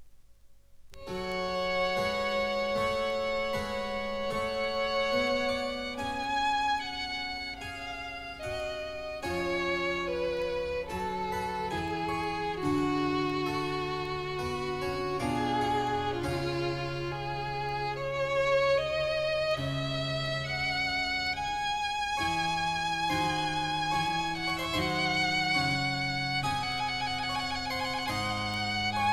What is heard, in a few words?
Handel Violin Sonata Op1-14